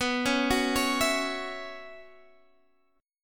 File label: Gb 7th Suspended 4th B